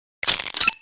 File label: mine deploy, weapons